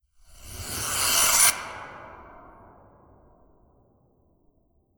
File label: Spell; legend of keepers gamerip 2019; Naga Spell2